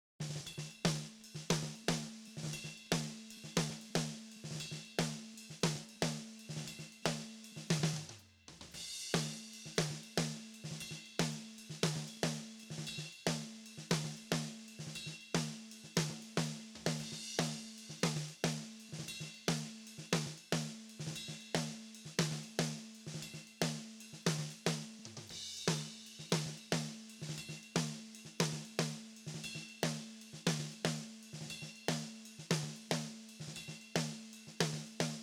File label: snare